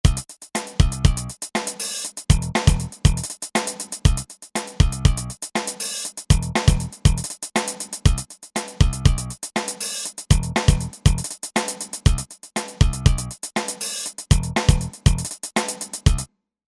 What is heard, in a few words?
Funk